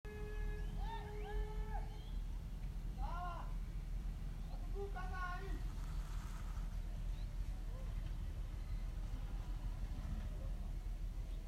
Knife sharpener a tune, Atonal: The knife sharpener